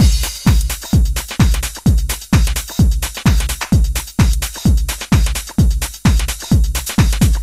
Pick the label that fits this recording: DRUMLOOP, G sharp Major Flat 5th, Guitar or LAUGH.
DRUMLOOP